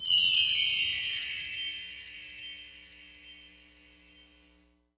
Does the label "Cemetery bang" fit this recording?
No